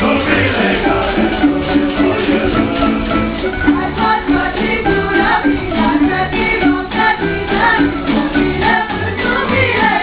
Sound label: women dance group; Dubrovnik; Croatia; Folk Dancing; Europe